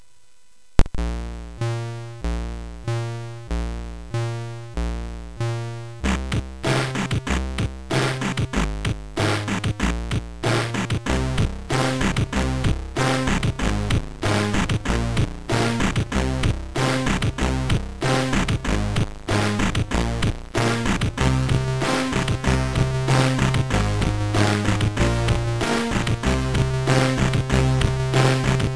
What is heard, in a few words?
Rock, MOS6581R4; Iron Rock 2B; hvsc